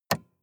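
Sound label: sports car interior switch off; verkehr transport; autos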